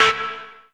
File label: Synths D; vengeance essential club sounds-3